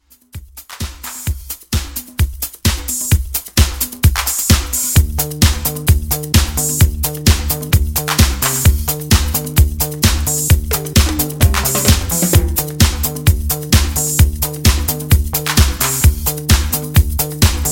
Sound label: 80s Disco Re Drum 130